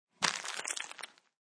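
Grab Plastic Wrap Food, Plastic food wrapping or package such as chips or cookies crinkle; crinkle sounds; Crinkling; Crinkling Sound Effects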